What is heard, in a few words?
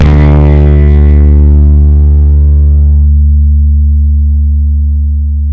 FUZZ D 3, bass; Spectrasonics; Bass Legends